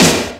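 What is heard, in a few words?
snare processed, Disco House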